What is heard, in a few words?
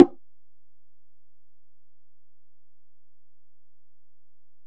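Acoustic Percussion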